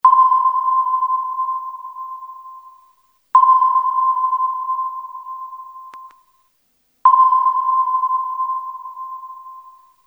sous marin sonar

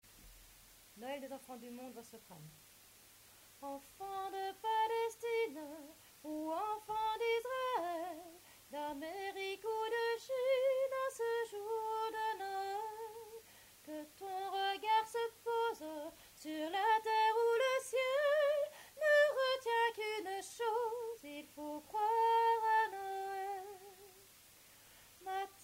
Soprane; Noël des enfants du monde

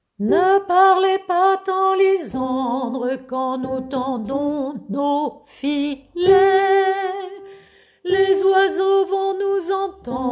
basse

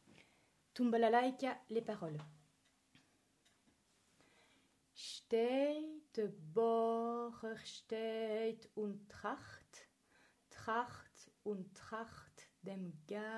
Tum balalaika Paroles

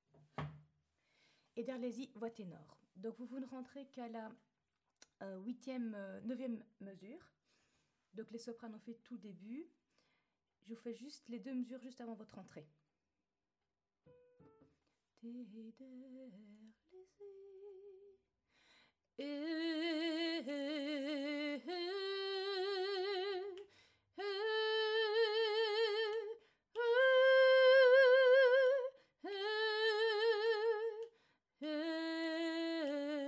tenor

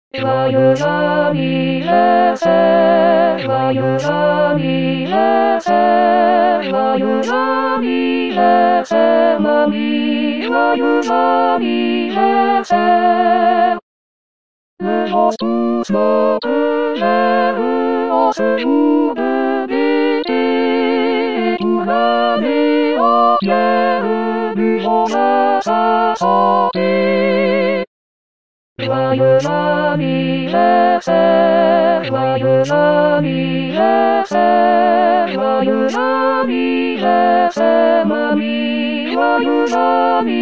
Choeur

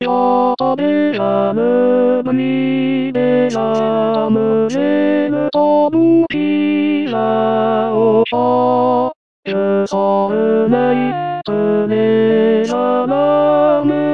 Tenor; L'homme armé

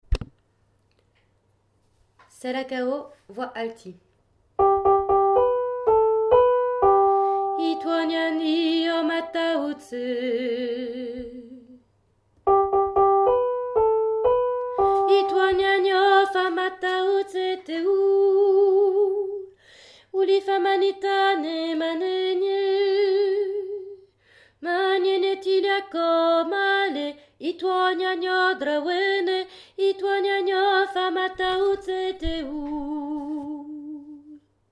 Salakao Alti

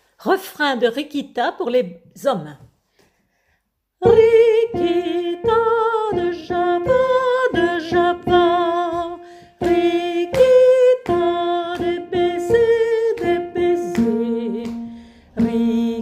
refr hommes

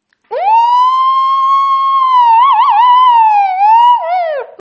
police

